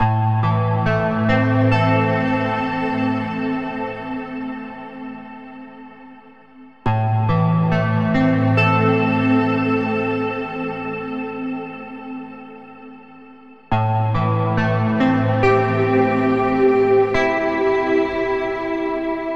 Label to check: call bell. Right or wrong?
Wrong